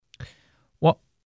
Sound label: breath